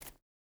footstep barefoot concrete jump start; Rust

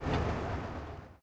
engines; World of Tanks

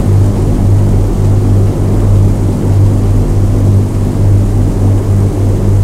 avro lancaster cockpit, engines; War Thunder

